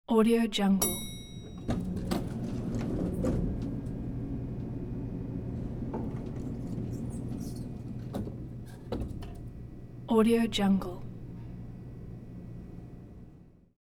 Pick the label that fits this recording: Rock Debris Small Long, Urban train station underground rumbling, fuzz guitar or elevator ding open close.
elevator ding open close